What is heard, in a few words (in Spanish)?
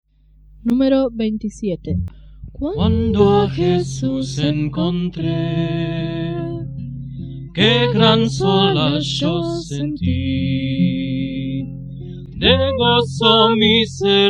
Coro, himnario niños